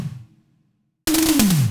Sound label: Fills, Loops 140 BPM